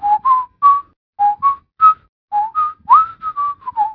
WHISTLE; Duke Nukem; 3D Atomic

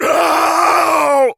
pain death; Duke Nukem; Forever 2001

